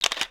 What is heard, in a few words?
camera shutter; LG; BL20-New-Chocolate